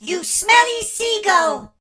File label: hurt vo; darryl